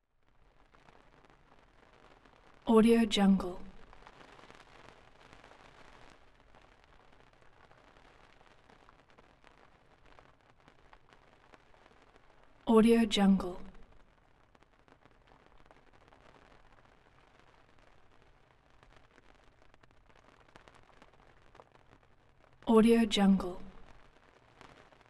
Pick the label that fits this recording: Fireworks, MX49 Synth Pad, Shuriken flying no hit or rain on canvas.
rain on canvas